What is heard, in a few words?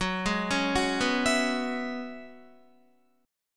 Gb 7th Suspended 2nd Suspended 4th Gb down Guitar; arpeggiated; JGuitar